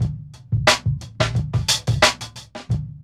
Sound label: LOOP, DRUM; E-Lab; X Static Goldmine 2